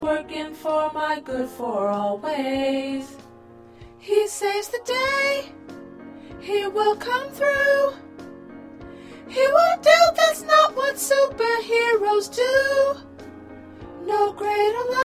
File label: Soprano, choir